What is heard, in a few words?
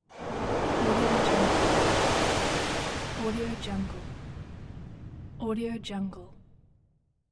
rolling wave breaking at beach